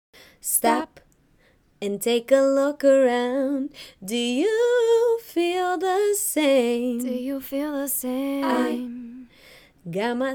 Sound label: Pop 122 BM